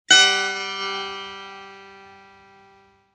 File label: setar sol b